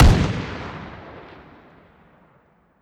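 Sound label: weapon lmg fire player atmo ext; gmod; viper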